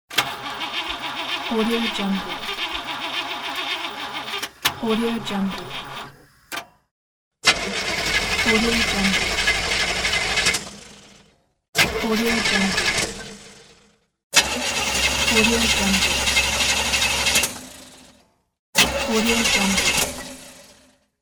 car hard to start

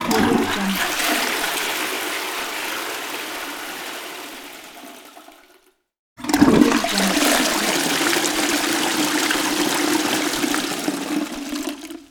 water closet toilet flush

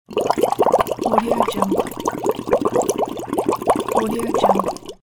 bubbles

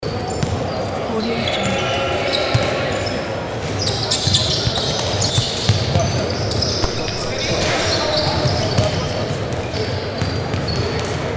basketball

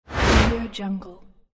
whoosh transition

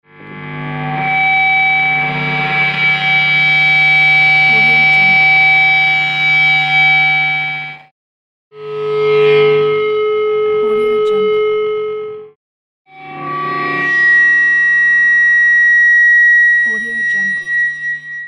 guitar feedback; inplano

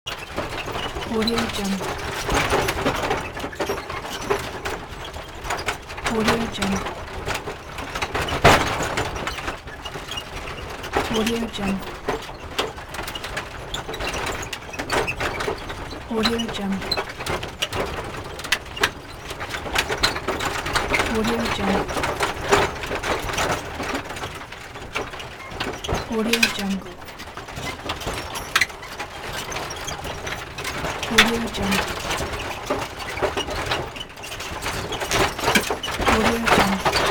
stagecoach interior

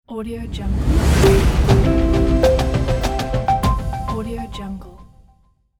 a logo opener; sfx-for-you

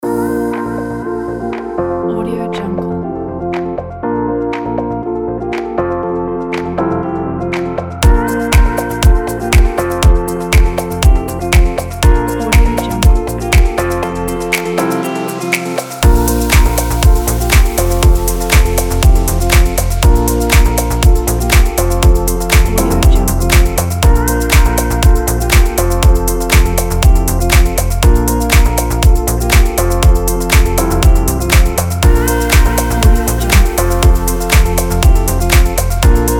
fashion summer house

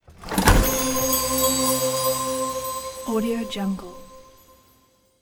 wonder discovered unlock sequence; empire game settlement alert

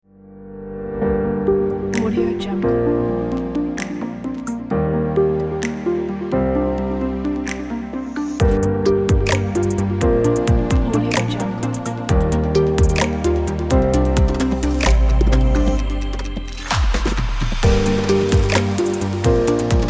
digital corporate technology

